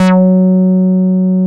SQUARE, BASSES; Masterbits; Vintage Voltage

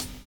VINYL HH, HH CLSD, Drums Dance & Groove

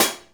Hi Hat Closed; One Shots; Essential Percussion

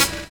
HIP SAX; Univers Sons

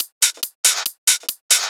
Loops 140 BPM Hihat Percussion Loops

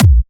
Trance Kicks

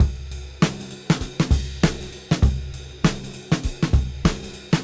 Cym Beat 99 BPM; AMG - Keith LeBlanc Phat Butt Jams

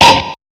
Hats, Alesis Performance Pad

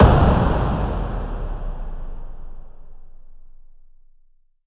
Impacts, Effects

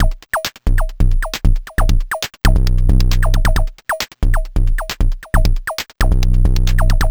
Drums Strange

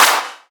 Claps; Vengeance - Essential Clubsounds Vol.5

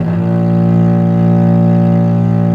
A#0, VIOLE, Historical Instruments; USB Soundscan vol.23

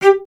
STR VC G 2, Cello; Miroslav Vitous; Solo Instruments VOL-1

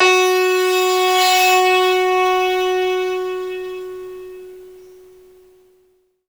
RATTL; E-MU Producer Series Vol. 5 – 3-D Audio Collection; 3DSynths; 3DBosora

